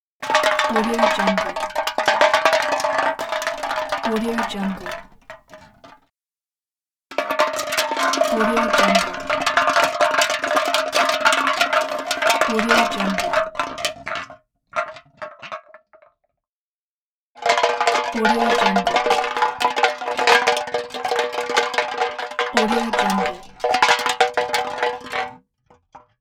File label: empty beer can rolling